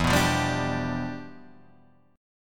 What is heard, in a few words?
E Minor 7th Flat 9th; Em7b9; strummed; JGuitar